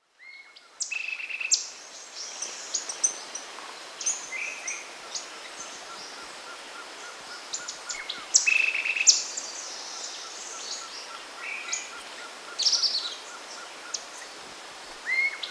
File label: Bronze-Green Euphonia (Euphonia Mesochrysa), Andean Birds; S. America